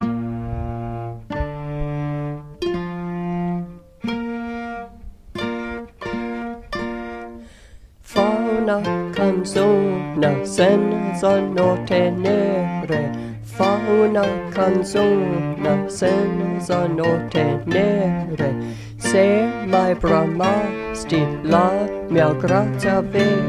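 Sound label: Bass Words; Fa una canzona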